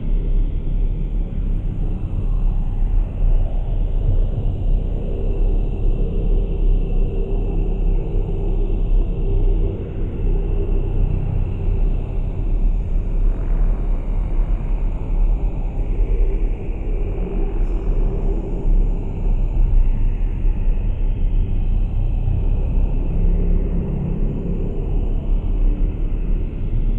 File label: ship juggernaut ship idle; Stellaris; shroud; ships